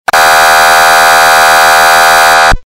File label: AirHorn